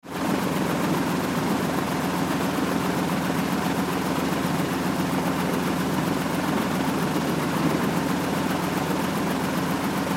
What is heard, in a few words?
Air Boat Rev; Air Boat Idle; Boats (Sound Effects:Vehicles)